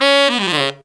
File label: TEN C# SF, Phantom Horns TENOR FX; Zero-G